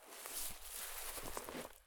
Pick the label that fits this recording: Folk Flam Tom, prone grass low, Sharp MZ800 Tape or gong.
prone grass low